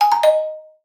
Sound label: connected; MinecraftChat